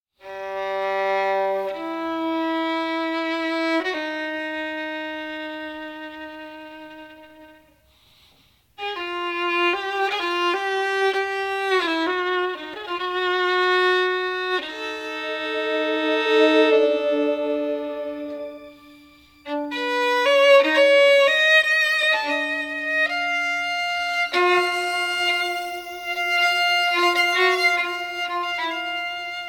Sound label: solo